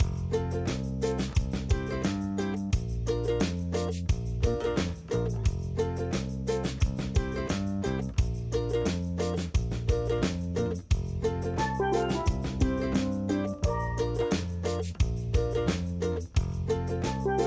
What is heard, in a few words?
Background; Dolph Dive